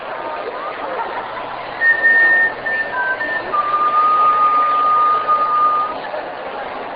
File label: whistle